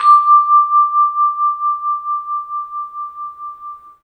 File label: bell; 3D Sound; 3D Keys VOL-1